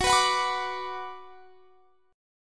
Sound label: Gb Suspended 4th Sharp 5th Gb, down; strummed